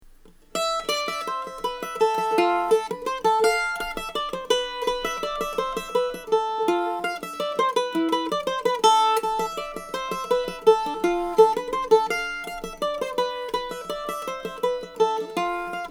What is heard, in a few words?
Mandolin